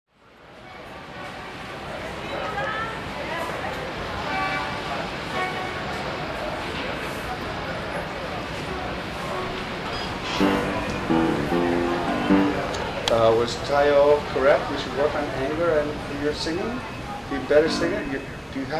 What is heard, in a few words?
Vocal Class